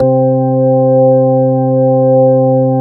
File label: MELO PERC